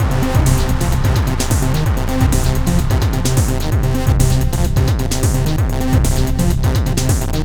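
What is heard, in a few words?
Core, Hardcore Breakbeat, 129 C minor; Big Fish Audio; Hardcore Breakbeat Action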